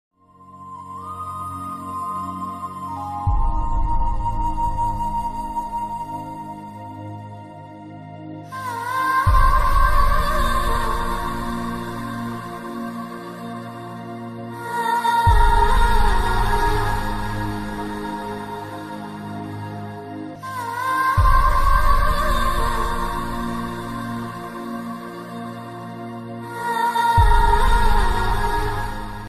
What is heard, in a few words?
Heart Touching Instrumental Ringtone